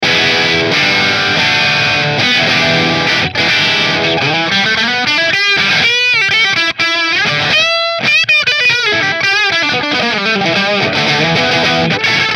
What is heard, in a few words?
Gibson Goldtop Bridge Marshall